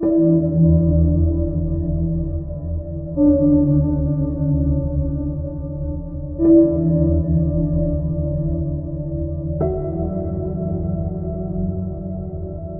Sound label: Ambient Plucks D Major